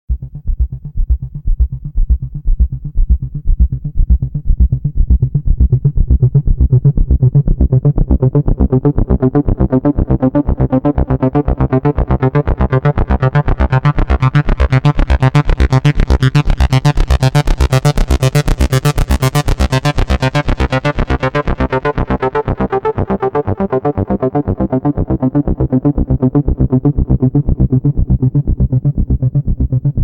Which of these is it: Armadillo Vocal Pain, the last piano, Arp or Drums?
Arp